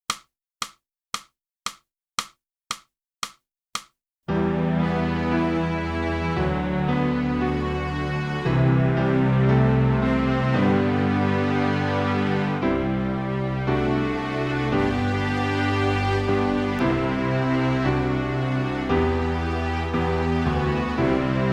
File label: flute; boosey